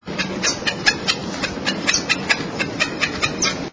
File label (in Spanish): chiricote